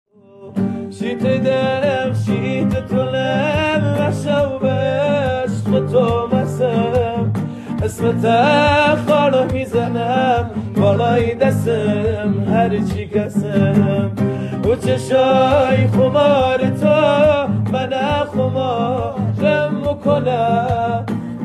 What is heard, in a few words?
kordi live voice